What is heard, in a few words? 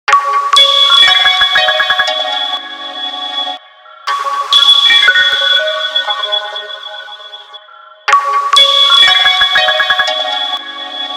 Micro Sound, ringtones; Motorola Droid3